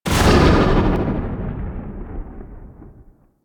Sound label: storm activate; Warframe; Warframes; Ninja